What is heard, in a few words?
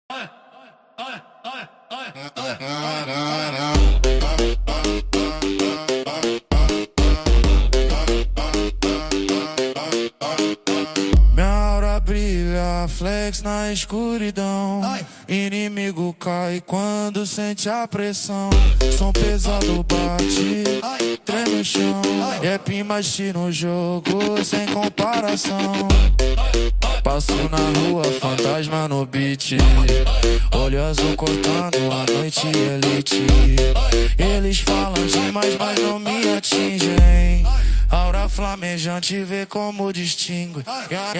phonk; roblox